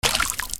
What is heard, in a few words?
WATER